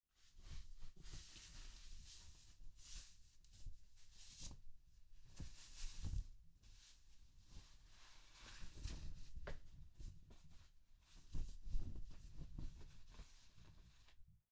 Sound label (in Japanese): シャツを脱ぐ; 3DIO; 色々な動作